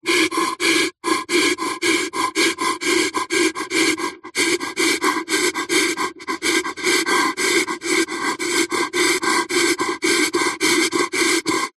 breathing firefighter mask pov fast panic breaths; human

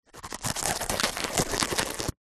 cartoon rubber latex pants squeak; zapsplat

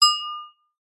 household crockery ceramic hit with fork; zapsplat

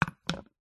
sport cricket bat set down on hard ground; zapsplat

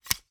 medieval dagger place in sheath fast; still north media

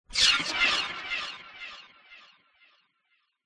sound design sweep stutter flanged white noise

